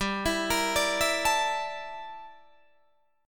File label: G Minor 6th Add 9th G down Guitar Drop D